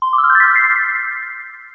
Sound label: ground; rollon; Puzzle